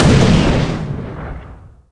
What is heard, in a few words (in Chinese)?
Tank fire, 坦克开火音效; 攻击音效 fire; 海岛奇兵